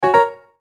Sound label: notification; Monster Arena